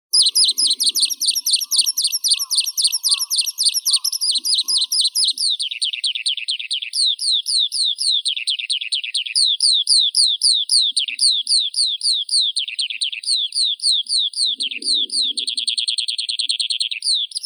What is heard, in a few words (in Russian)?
zhavoronok yaponskii alauda japonica, zvuki prirodi